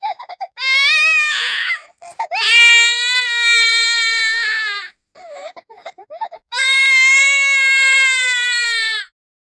bacche ka rona, baby

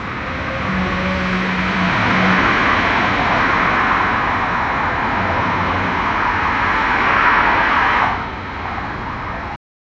car se car bhidti hai, crash

